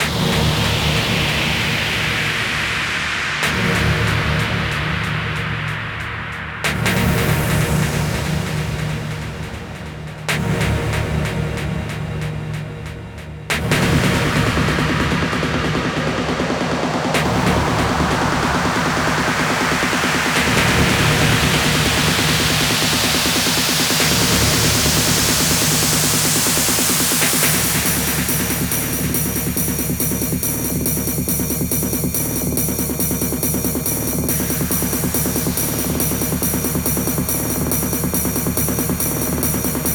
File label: 140BPM 32Bars D#, Hands Up Trance Fills; Vengeance Samples